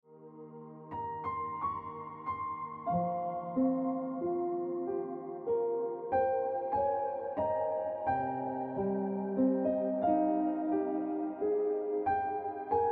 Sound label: Ringtone Piano Music